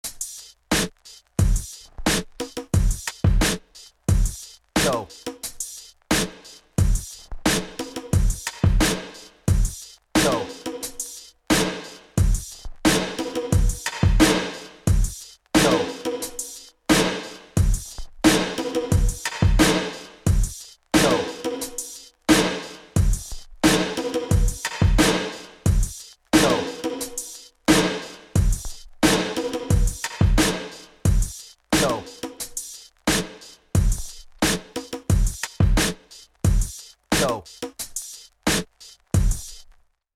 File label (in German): Master Ambience Regler